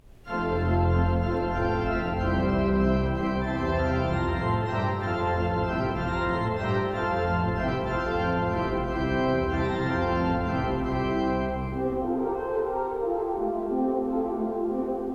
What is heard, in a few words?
Allegro Vivace Water Music; Handel